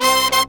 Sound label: BRASS SAMBA; World Grooves; Best Service ProSamples vol.14; AKAI